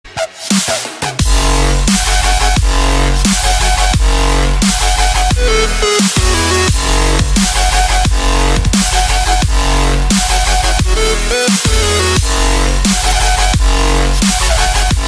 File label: Drumstep